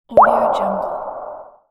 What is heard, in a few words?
water drop